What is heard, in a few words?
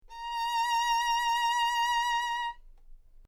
violin